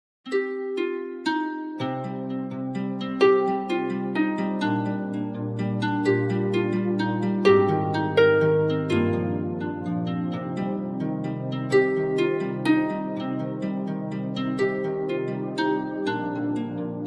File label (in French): La harpe de David; Josue Grace; Respiration musicale